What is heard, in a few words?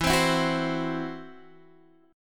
C-Major Flat 5th E, guitar